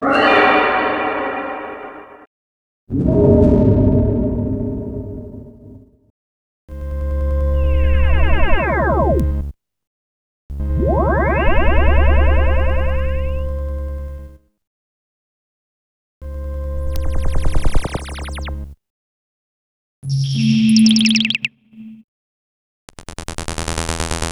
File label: Ambient FX; X-Static Goldmine 5